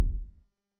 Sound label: giant stomp; More Creatures